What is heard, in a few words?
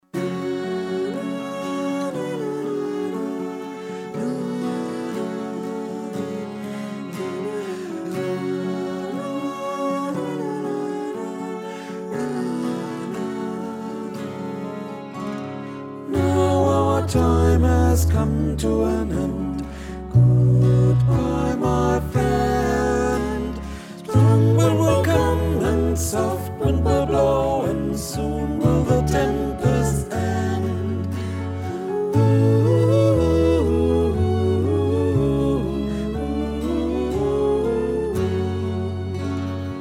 Tenor